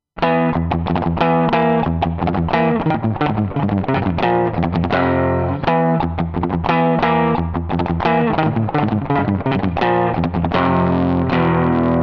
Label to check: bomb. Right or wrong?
Wrong